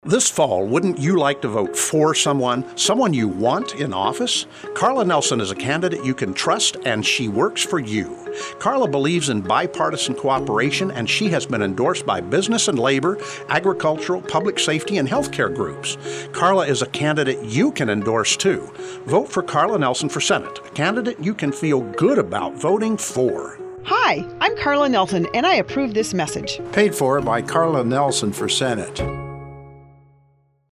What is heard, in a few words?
with Music no claps